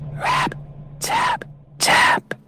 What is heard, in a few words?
rap tap tap